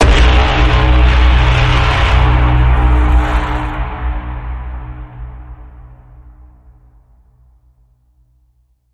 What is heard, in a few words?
Bend, B